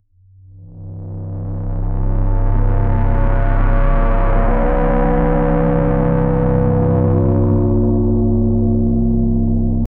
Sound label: open with a booming bass, ambient